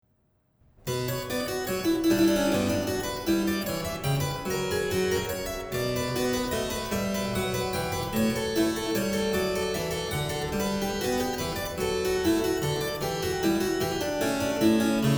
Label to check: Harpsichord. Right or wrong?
Right